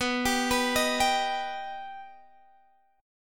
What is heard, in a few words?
Eb Augmented B